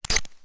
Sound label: Beepy Glitch